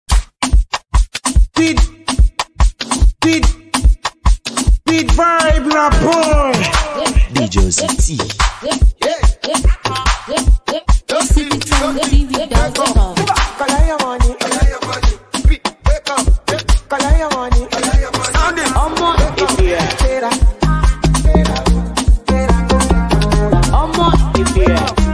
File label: Amapiano Dance Version